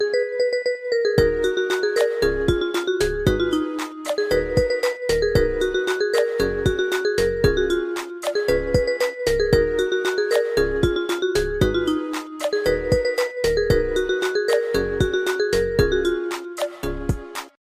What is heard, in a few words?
 instrumental ringtone